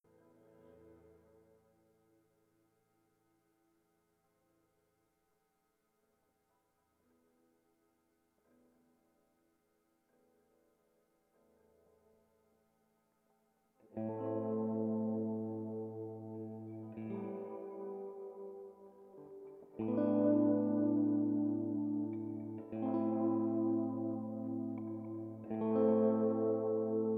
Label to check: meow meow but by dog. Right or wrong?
Wrong